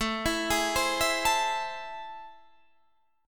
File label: A Minor Major 7th